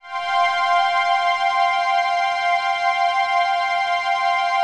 CHRD PAD; Best Service; XX Large Pads; Sample CDs

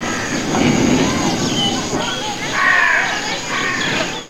jungle, Insect Ambience; SFX

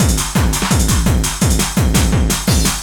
LOOP 170, Eurotech; Sounds Good

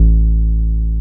303 SUB A2P, SUB BASS

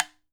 OKAWA, JAPAN PERC; AKAI S6000 CD-ROM; WorldSounds

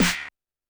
Snare, Roland TD-20

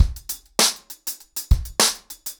100BPM, ACOUSTIC DRUMS

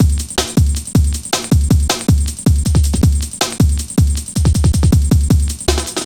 Drum Loops, 158.5; Jungle

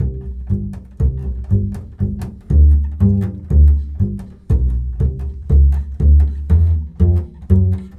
Bull Fiddle, Appalachian

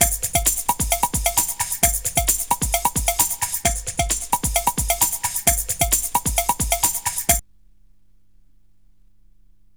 DRUM, 132; Megabass Remix! 2

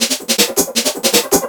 LOOP L, Drum & Bass 160 BPM

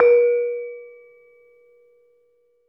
LAMELS A#3-L; USB Soundscan vol.60; AKAI; LAMELOFO

